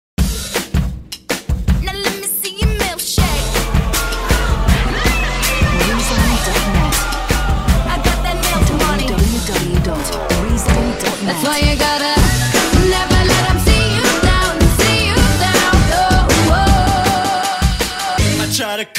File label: montage 160bpm